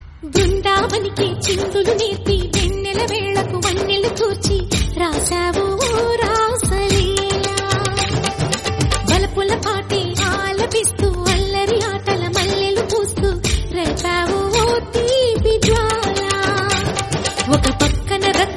telugu song

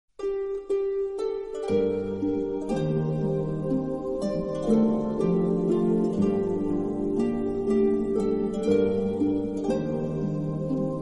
japanese theme

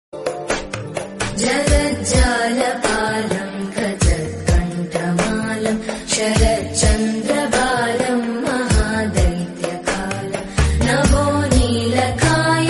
holy chants on Vishnu, Sanskrit; Mahalakshmi

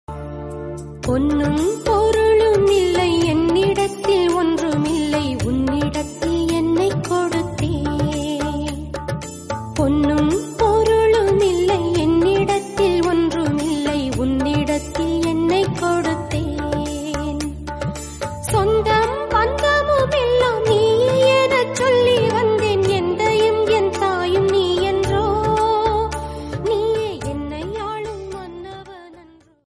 tamil song

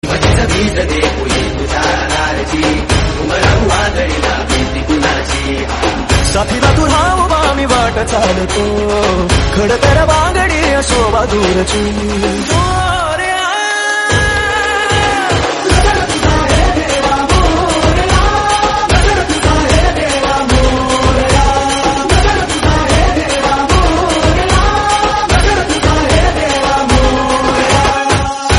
dj marathi

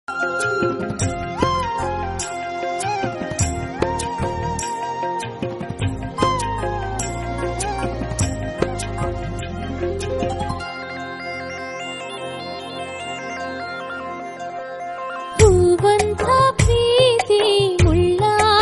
kannada new songs

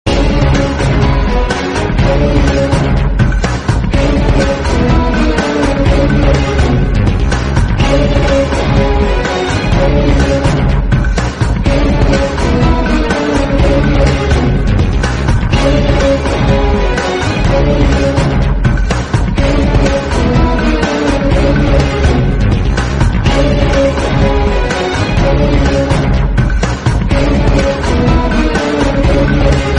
theme instrumental bgm

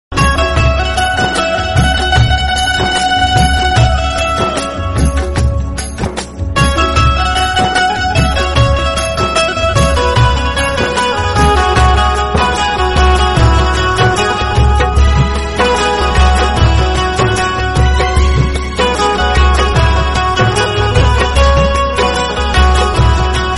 instrumental, benjo

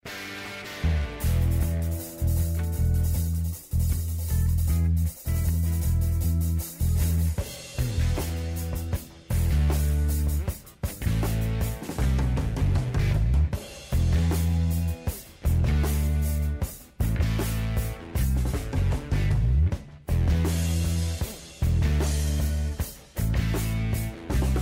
ring tone

